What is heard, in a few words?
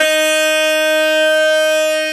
i just wanna tell you, tell, e, D#5, melo, long